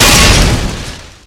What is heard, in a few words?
impact generic